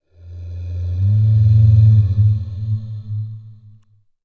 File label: Voices